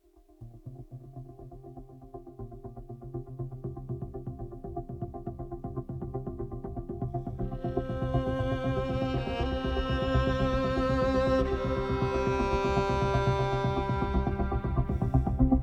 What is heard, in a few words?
Magical Power 120BPM; Production Music Examples